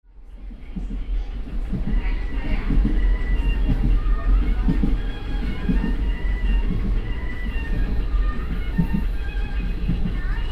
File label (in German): ubahnfahrt handymusik